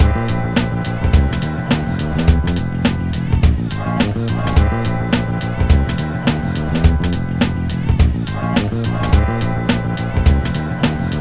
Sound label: Load Loop; NBA Street; GC; PS2